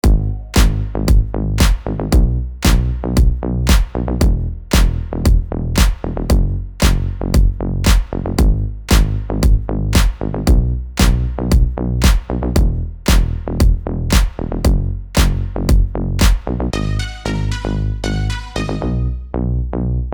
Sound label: Gm 115 BPM Pop